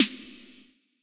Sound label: Reverbed Snare; Zero-G; Nu Disco